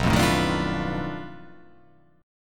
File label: B 7th Sharp 9th Flat 5th, down